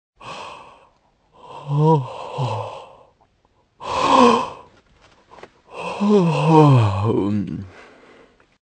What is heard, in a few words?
yawning